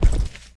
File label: SFX Footstep; this is the zodiac speaking 2020 gamerip switch ps4 xbox one pc macos